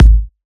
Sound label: Techno Kick; Advent Calendar - Day 6 - Techno Kit; Kick One-Shots